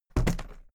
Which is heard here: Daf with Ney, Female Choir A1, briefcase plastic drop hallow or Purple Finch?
briefcase plastic drop hallow